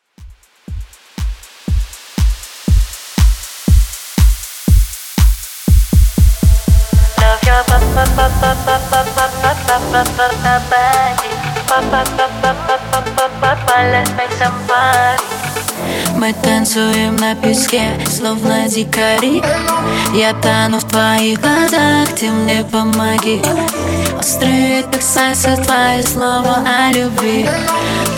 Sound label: Intro Club 120Bpm